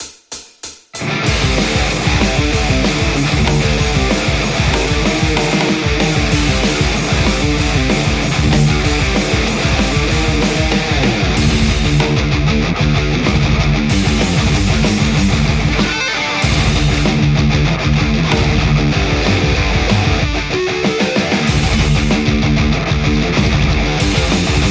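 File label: metal Telecaster; poulin; lextac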